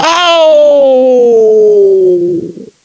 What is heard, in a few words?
Gore Death, Ginger